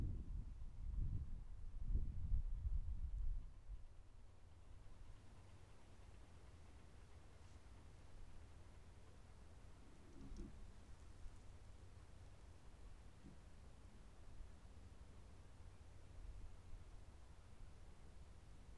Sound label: Inside Red Rocks